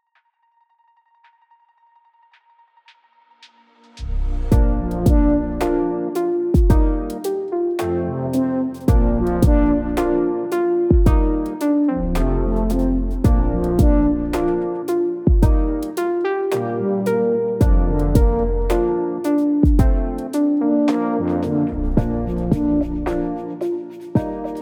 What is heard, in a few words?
110 bpm